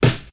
fresh damped acoustic snare b key

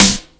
airy steel snare drum sound g key

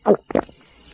gulp and burp

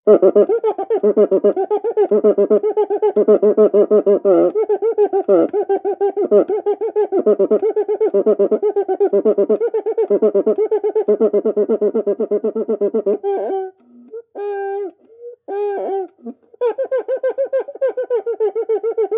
metal cuica contemporanea; brazil

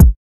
reverb steel kick drum a sharp key

